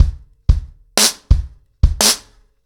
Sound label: 90BPM, acoustic drums, drumloops